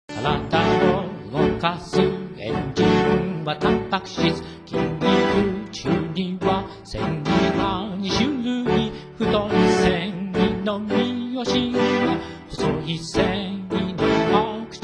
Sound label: tango